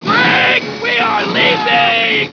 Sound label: leaving, aliens